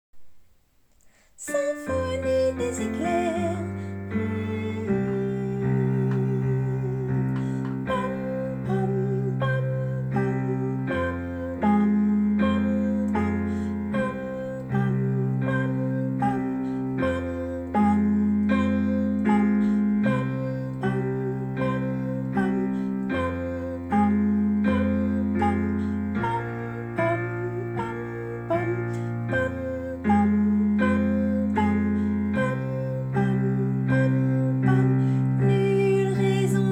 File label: tenor